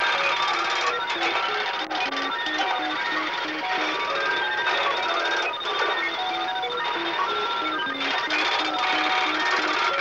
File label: Cartoon Computer, beeps & tones